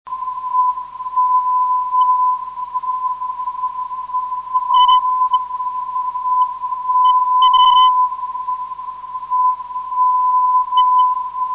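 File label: en 331.74khz, non-directional beacon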